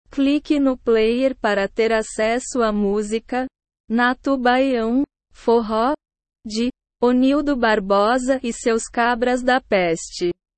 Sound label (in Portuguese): baiao forro